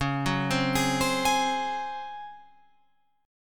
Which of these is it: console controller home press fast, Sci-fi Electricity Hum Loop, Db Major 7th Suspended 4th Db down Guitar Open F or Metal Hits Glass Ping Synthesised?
Db Major 7th Suspended 4th Db down Guitar Open F